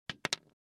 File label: Dropping chalk stick